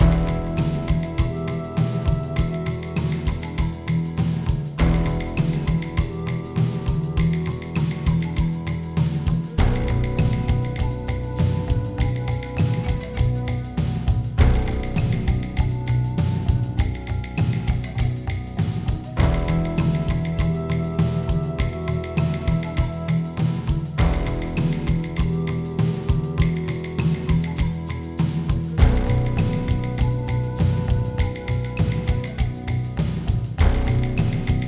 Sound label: Spell Chant; Tak The Great Juju Challenge; GC